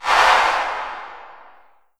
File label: LH C808 -R, REVRB TR 9+8 LARGEHALL808